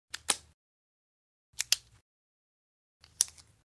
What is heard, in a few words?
Pressing a button on a ballpoint pen